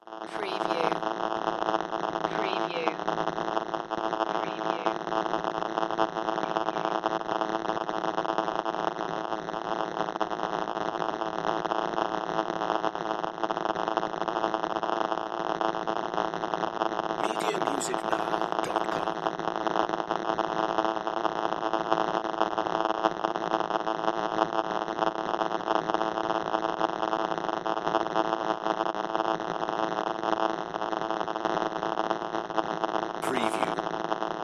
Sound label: Electric Arcs Constant; Sound Effects Bible